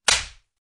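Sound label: slap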